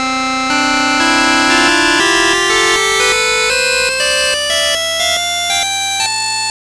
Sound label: music